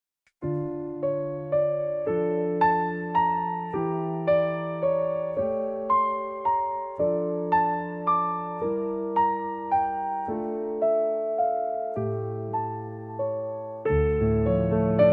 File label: piano ver.; Requiem, K. 626 No. 6 Lacrimosa; Mozart